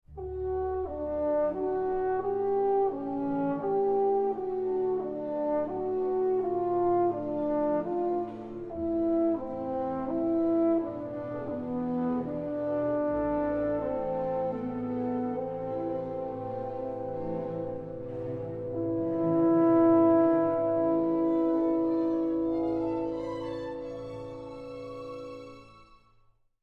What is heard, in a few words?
phantasy horn and orchestra